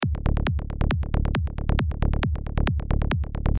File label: bassline resampled variation processing